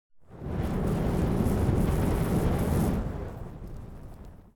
ambience room forge working layer

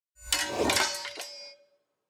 ui battle begin; Shelter

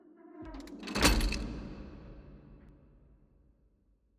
torture cage close; ambience room

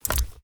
ui submenu open

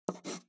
ambience room shadow room working layer

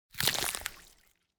environment heart damage gore

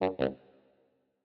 ui error

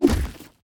order move

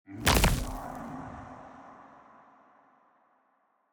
Fire Elemental Get Hit; TANK; 3Souls

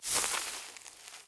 zone bush step

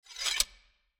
ui player sword put

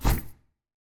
mob formation stack

